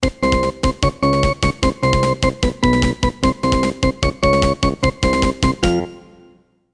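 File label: organ; Hardball 6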